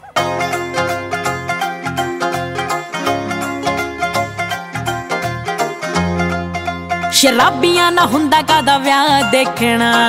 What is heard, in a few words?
new punjabi song ringtone